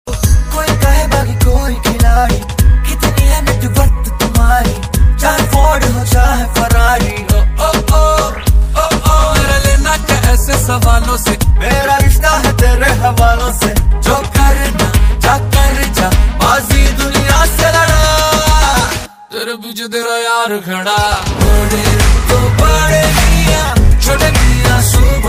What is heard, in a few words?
ringtone